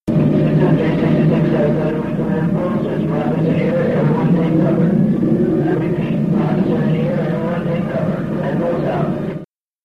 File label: rockets attack rockets in the air take cover